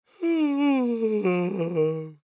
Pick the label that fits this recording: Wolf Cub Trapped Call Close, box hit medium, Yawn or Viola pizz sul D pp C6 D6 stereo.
Yawn